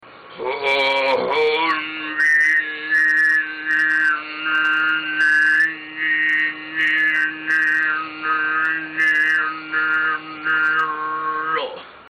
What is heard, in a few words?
sygyt ezeng, khoomei